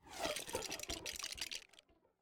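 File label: Titles Loop Brick build sound effects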